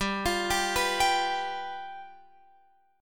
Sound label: G 7th G down Guitar Open F; G7 arpeggiated